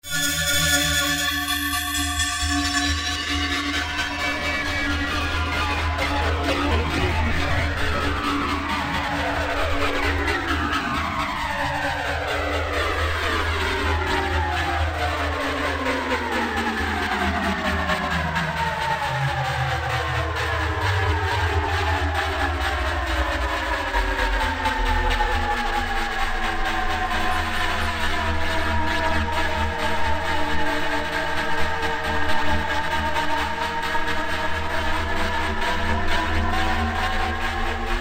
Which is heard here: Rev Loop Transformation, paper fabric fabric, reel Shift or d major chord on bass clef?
Rev Loop Transformation